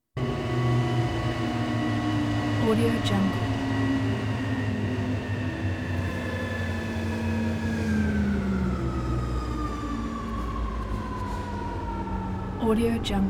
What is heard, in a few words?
metro train